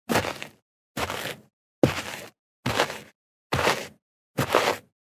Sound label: Footsteps walking on gravel